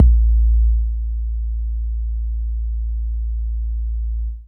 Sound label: KICK; Masterbits - Total Zone; DRUM ZONE